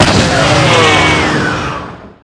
nebelwerfer; Spearhead; weapons fire

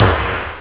dzing; OctaMED SoundStudio V1

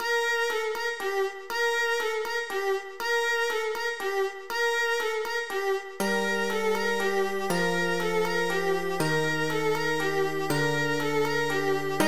bells; Millennium Gold 2000

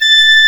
flute A5; Star Trek JR Audio

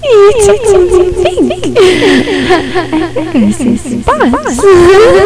chick; Designer Bodies

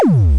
zoom; Dabbler

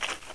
gravel; Exile II - Crystal Souls

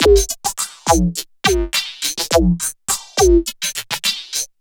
Packet, downtempo beats

